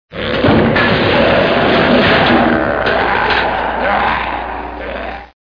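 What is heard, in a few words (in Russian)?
монстр шумный массовый в пространстве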